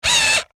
Industry Electric Drill Tighten Screw Whine Stress Short; Drills; SFX Bible